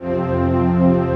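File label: chord pad, Pads; Best Service; XX Large - Pads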